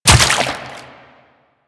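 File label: step on water, footsteps; Warframe; The New War; Kahl; Quests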